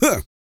GRUNT, MALE HUMAN; Spectrasonics Vocal Planet

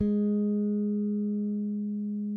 SPEC SUSTAIN, Bass; Sampleheads; Will Lee Bass Library

